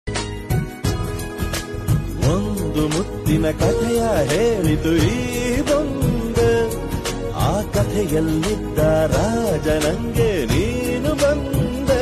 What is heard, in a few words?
Ringtone